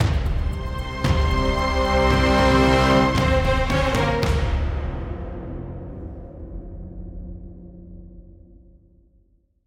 Graveyard Suspense

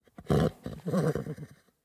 horse pair vehicle idle; teardown ps5 windows xbox series xs gamerip 2022

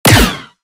lightning drone shot

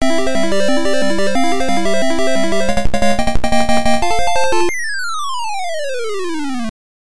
Perfect Result in Challenging Stage; Galaga; MSX

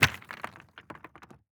masonry break

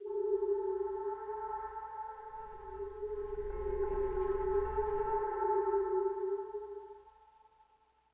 Dark Ambience One Shot

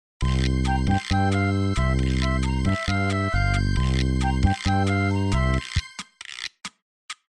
bossanova; 3D Movie Maker

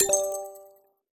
Level Select Move Cursor

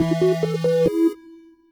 main battle fanfare; terrorbane

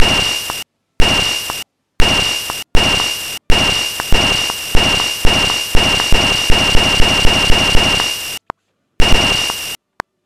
Warning; Shinobi non Grata

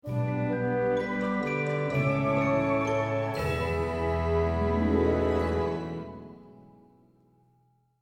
Good Stinger; LittleBigPlanet 3; PlayStation 3

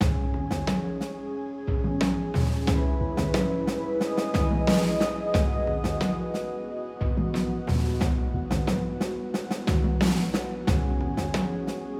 Fishing Village Loop

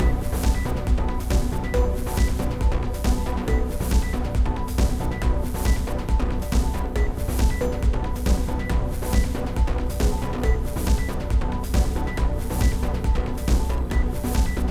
Combat, 138BPM

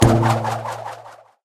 Sardine Can Shot

